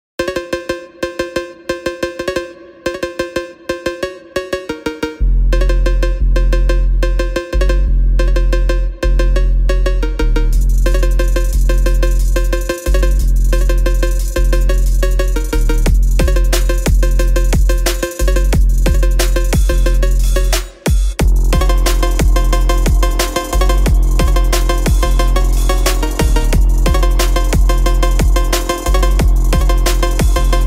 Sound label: phonk bgm; christopher